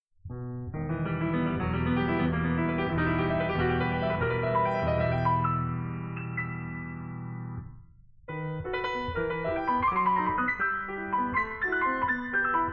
Valse Opus Posthume in Mi Mineur No. 15; Chopin

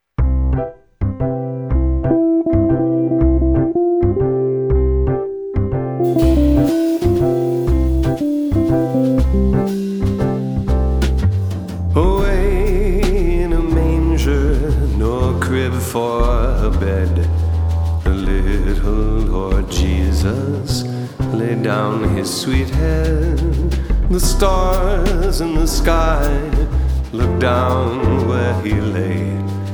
vocal; Away In A Manger

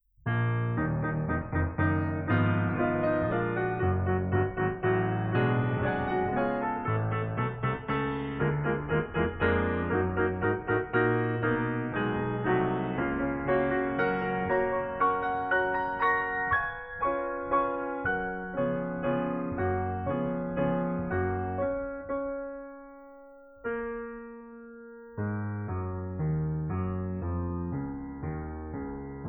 Piano Sonata No 2 in Bbm Opus 35 Scherzo

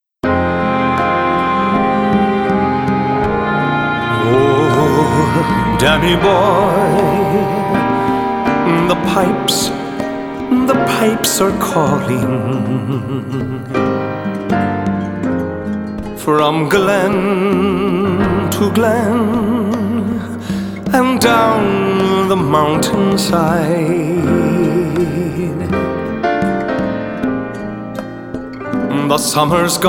Danny Boy Vocal

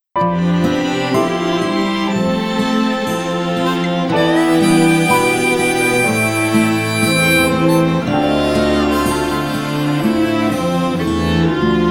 O Shenandoah Vocal

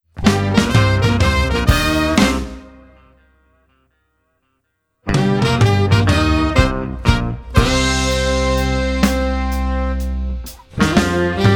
Back Alley Soul Blues

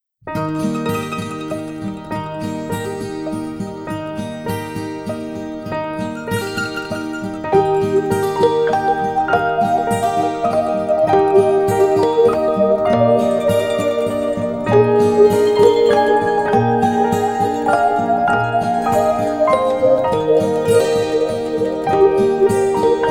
Rock A Bye Baby Guide

